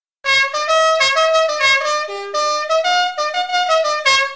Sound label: horn